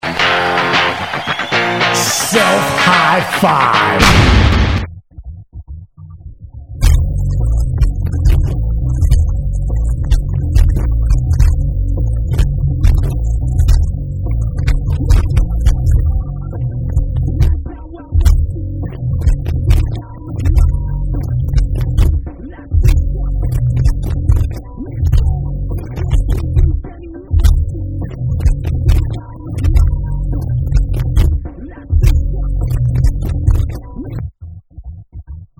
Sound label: instrumental